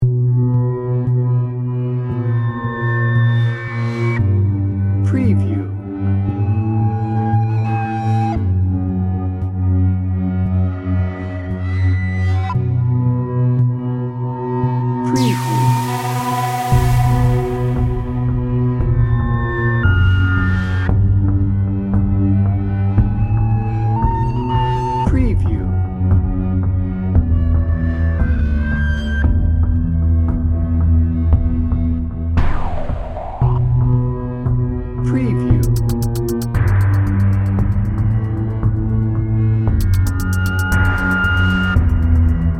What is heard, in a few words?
haunted dnb